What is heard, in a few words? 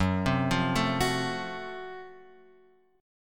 Gb Minor Major 7th Flat 5th, Guitar, Open F, chord; arpeggiated; x,1,0,0,0,1; JGuitar